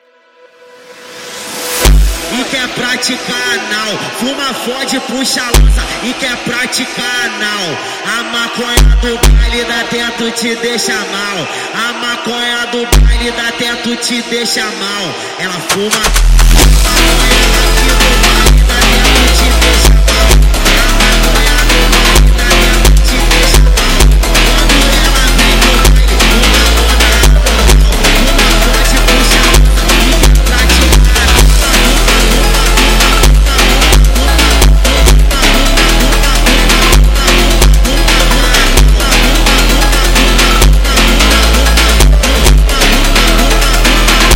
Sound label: Phonk Music; فانک phonk